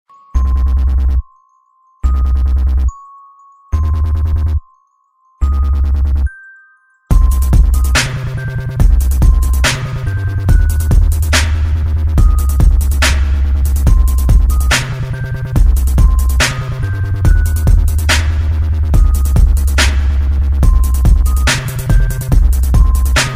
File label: hip hop bells n beat